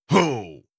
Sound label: effort grunt male